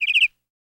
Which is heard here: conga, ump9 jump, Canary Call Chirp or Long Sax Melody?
Canary Call Chirp